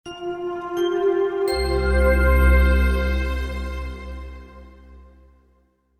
Shutdown, Shutdown, Computer: Short Musical Style Phrase; Miscellaneous Musical; Miscellaneous Musical Sound Effects; Miscellaneous Musical (Sound Effects:Musical); Stuart Duffield